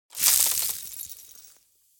ice break; frozen

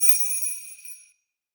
sleigh bells

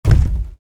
heavy thump, wooden heavy thump

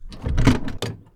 car slide door; vehicles

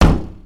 metal bang

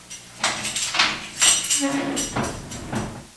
metal door, metal gate opening

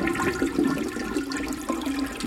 sink drain

